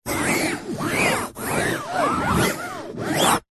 foley fabric pointed object scrapes fabric over hard surface whizzing sound; zapsplat